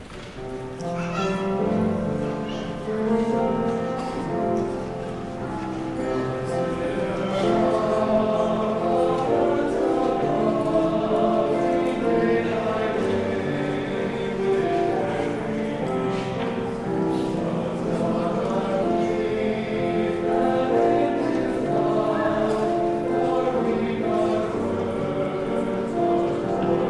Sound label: Choral